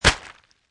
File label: Impact Body Splatter, Hard, sharp impact with human body or animal body; Bodies